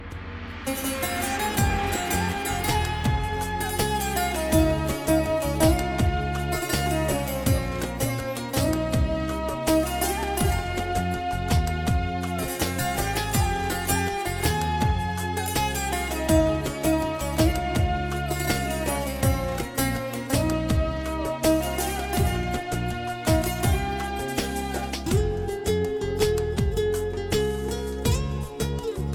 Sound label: Guitar ver